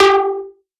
Techno Synth Shot G; Synth One-Shots; Ghosthack; 45 Free Techno Synth Shots 2025

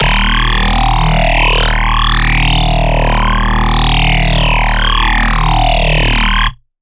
Riddim Bass Hit D#; One-Shots; Bass Hits; Solid Snail; Ghosthack; Riddim Essentials Volume 2

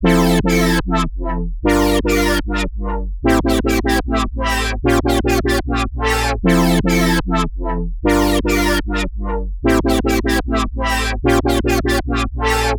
Supersaw Loop - 150 BPM F Maj; Synths; Drop Loops; Future Bass

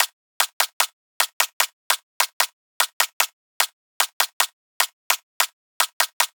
Clap Loop, Dubstep Percussion Foley 150bpm; Percussion Foley Loops; Ghosthack; Dubstep Sound Arsenal

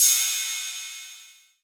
Cymbal (Space), Analog Drum Kit; Cymatics - ANALOG EVOLUTION Beta

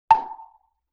Temple Block Hit Roomy; Vintage Cartoon; Drums & Percussion

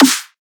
Riddim Snare; One-Shots; Ghosthack; Riddim Essentials Volume 2

